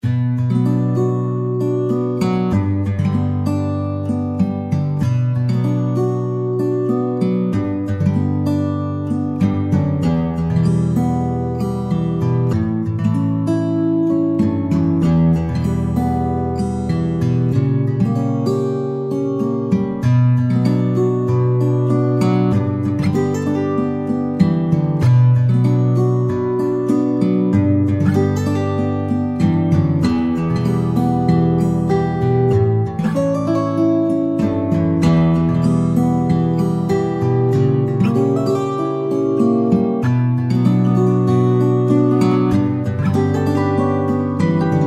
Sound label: SWEET FINGER